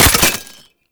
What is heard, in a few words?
Grenade Launcher Low Clip; Weapons; Scaldra